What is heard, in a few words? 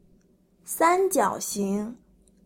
triangle